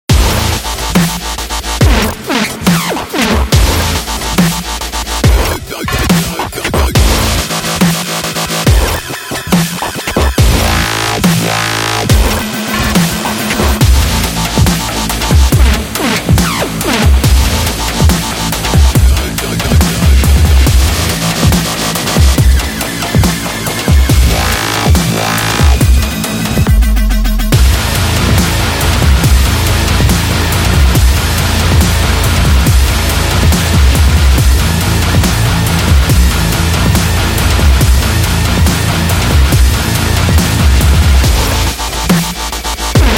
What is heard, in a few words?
Dubstep Sport